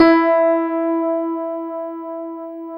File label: PIA MICRS, MICRO-PIANO; Sound & Vision - Gigapack II